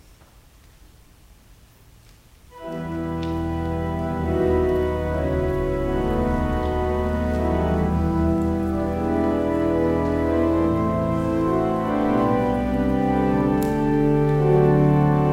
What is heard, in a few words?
Organ Music; Netherlands Reformed Congregation, St. Catharines, ON